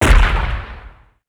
Launcher Nuclear Cast; bullet

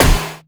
Mace Metal, Damage

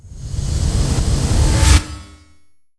force shield

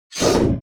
sword, Weapon

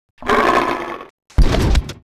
SNATCHER REX die

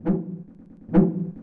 Fan, ambience

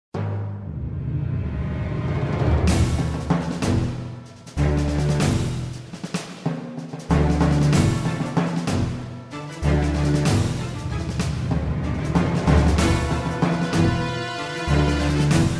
battle; Belato

